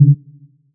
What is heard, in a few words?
alert nonmodal, ChromeVox Earcons, Reverb